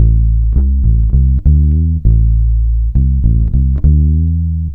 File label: bass jumbled, Loops, Phonky